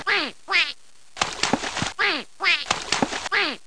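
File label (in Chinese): yazi jiaosheng, duck quacking; jiachu jiaosheng; jiaqing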